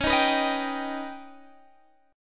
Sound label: Eb Augmented 7th